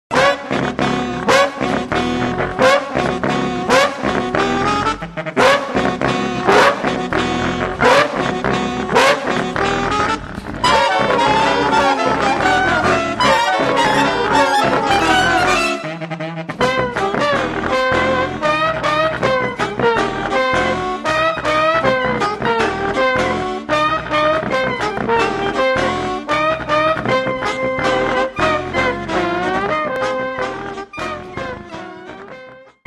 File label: tuba; tubax bb